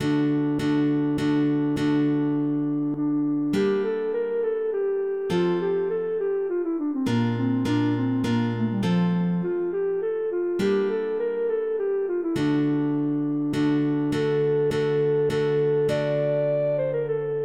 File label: Recercada, MIDI; Ortiz